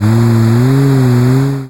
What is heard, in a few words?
camel